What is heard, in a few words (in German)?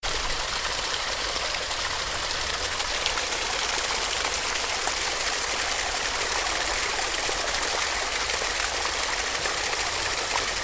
Wasserplaetschern